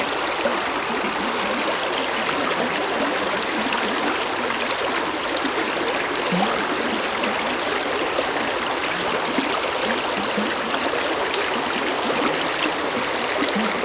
Soothing River

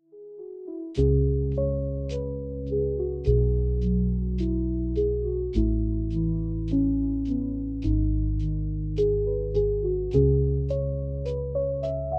lofi

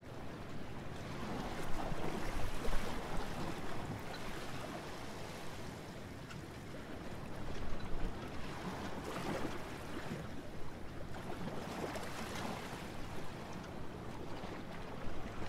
Sea Port Water White Noise

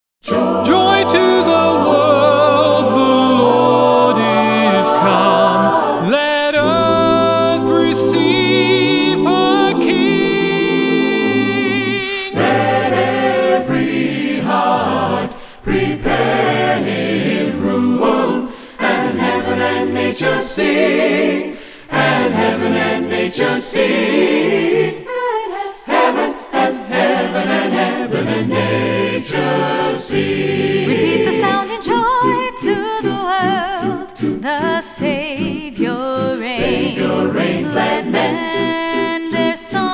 Acapella, Joy to the World

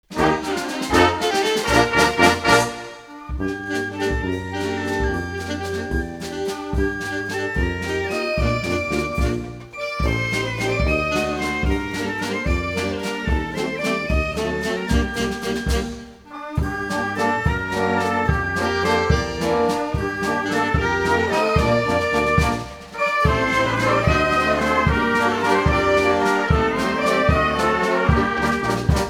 Banda Junior, violin

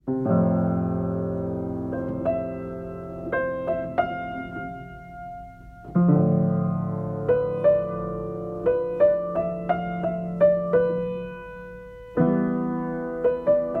Piano, Christmas

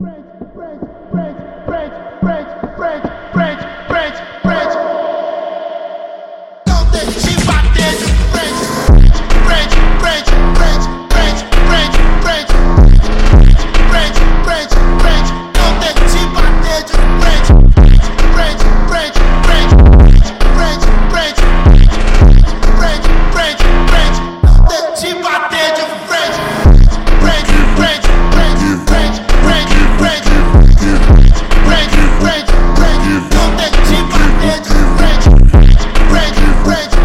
phonk brasileiro

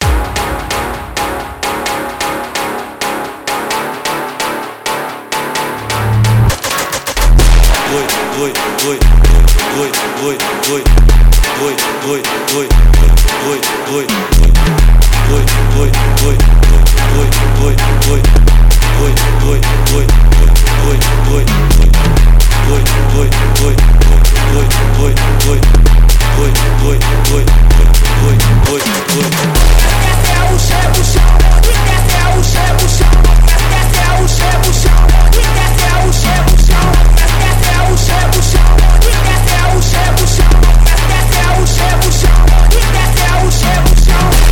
Phonk, FUNK

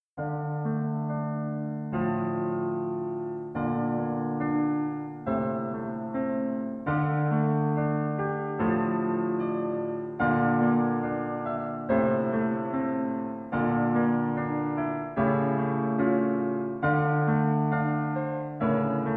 Chill Jazz-Lounge

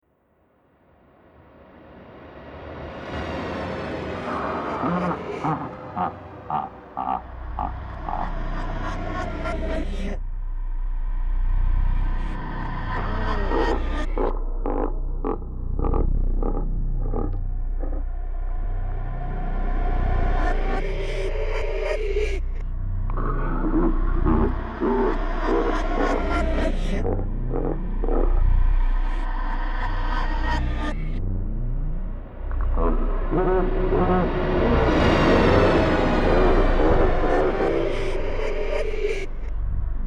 Meditation Music

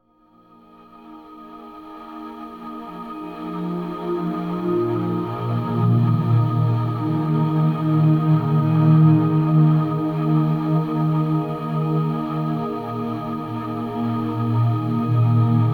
Ambient Sounds, Space Ambience

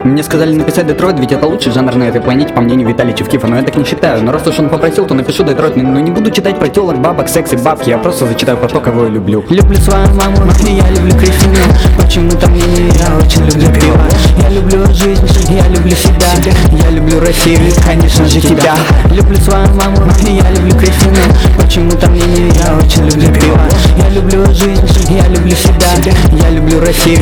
Jazz

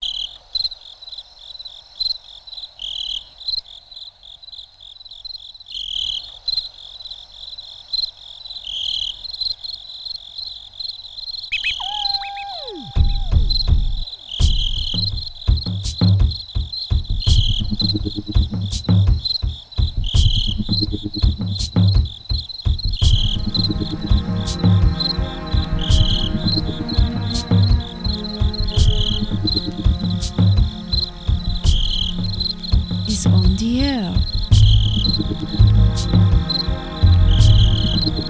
Ambient Electronique

